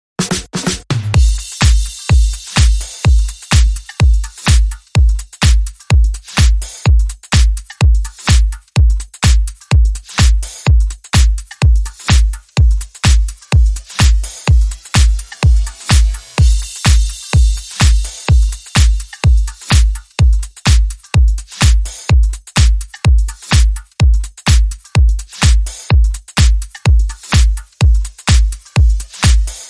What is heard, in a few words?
Clicking Heels Beat